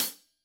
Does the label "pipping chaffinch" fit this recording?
No